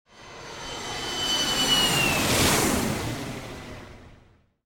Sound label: Jet Plane; Kai's Power SHOW; Business Show